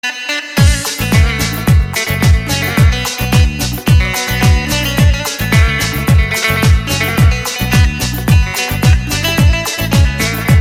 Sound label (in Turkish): zil sesi